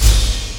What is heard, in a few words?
BD, Industrial; BD KIT; Industrial Loops